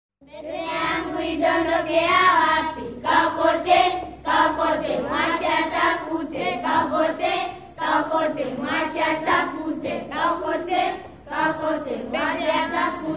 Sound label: Jump-Rope Song, Music of Tanzania